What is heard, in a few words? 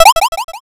picoyes